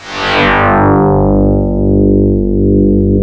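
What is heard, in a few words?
STRING C3, YAMAHA FM SYNTHS; Rubber Chicken Software Sample Bank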